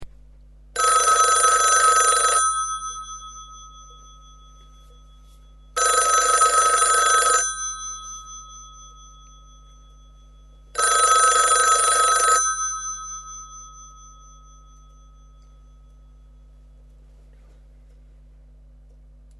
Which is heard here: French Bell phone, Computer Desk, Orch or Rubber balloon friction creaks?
French Bell phone